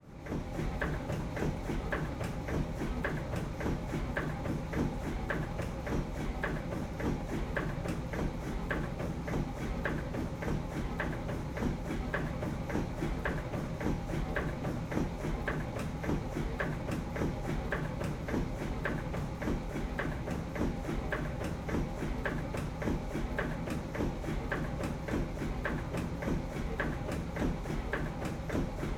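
tugboat onboard slow drive slight acceleraton engine room, Deutz VM 145; DPA4021; Pole Position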